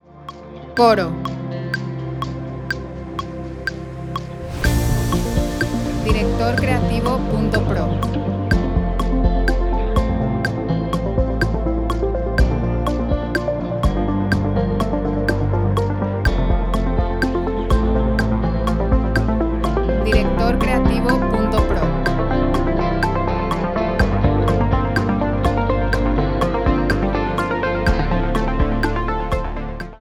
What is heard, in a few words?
REMIX F 62 4 4